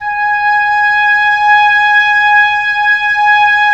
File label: WND OB P, Oboe p; Roland - LA Composer vol. 4